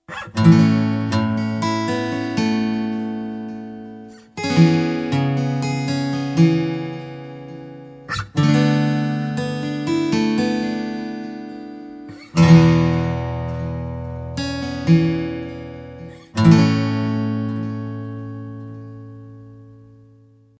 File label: chords plus picking